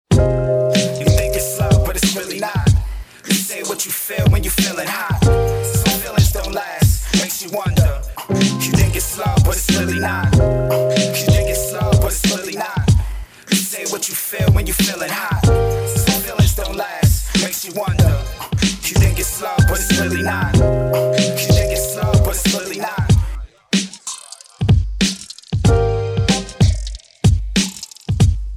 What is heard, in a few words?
DRUM STICK REMIX